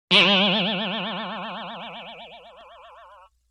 Boing Box Higher; Vintage Cartoon; Hits & Tones

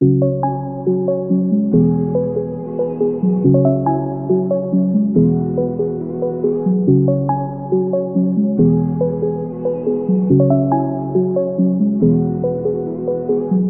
Nostalgic Melody Loop - 140 BPM G# Min; Cymatics; Oracle Sample Pack

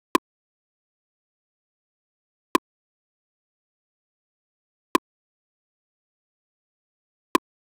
Drum Loop 100 BPM Percussion; Cymatics; Vibes II Drum Loop Collection; Brilliant Drum Loop

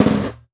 FX Snare; Drums; Ghosthack - Free Snares 2023

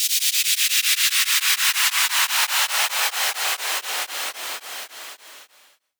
Future Bass White Noise Down 160 BPM; FX

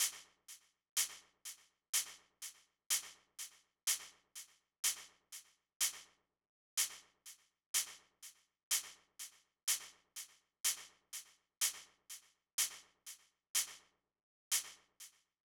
Drum Loop, 124 BPM Shaker; Cymatics; Vibes II Drum Loop Collection; Aftermath

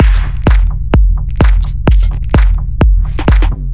Drum Loop, 128 BPM E; House; Full Drum Loops; Go Deeper; Cymatics

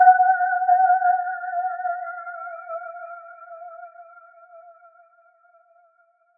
Riddim Melodic Hit F# Glass; One-Shots; Ghosthack; Riddim Essentials Volume 2

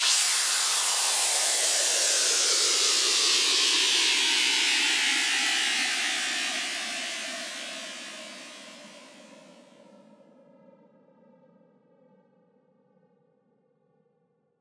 Formant White Noise Down; House; FX

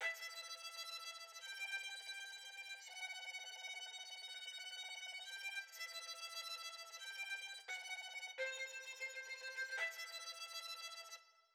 86 BPM E Min String; Cymatics; Gems Free Teaser Pack; Cymatics Gems Vol 9; Dreamers

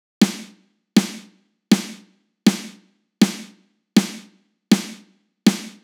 Industrial 160 Snare; Drums; Loops; Drum Construction Kits; Ghosthack; Drum Mega Bundle; Drum Hero 5

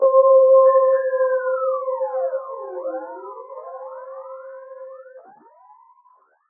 KEYS (C), Pedal One Shots; Cymatics; ANALOG EVOLUTION Beta

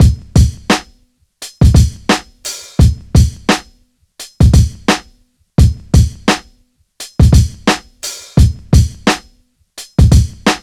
Drum Loop - 86 BPM, Boom Bap; Bristol; Cymatics - ANALOG EVOLUTION Beta

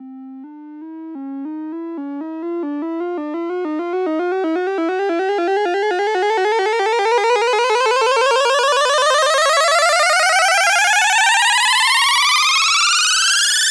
Riser Gameboy; Riddim; One-Shots; SFX; Ghosthack; Riddim Essentials Volume 2

